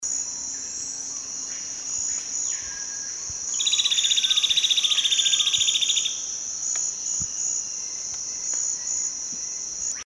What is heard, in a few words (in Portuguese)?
canto do chupa dente de cinta